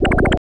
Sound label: flight